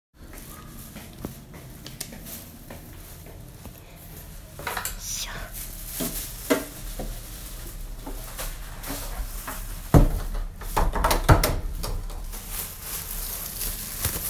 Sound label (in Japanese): 買い物ついでにおしっこ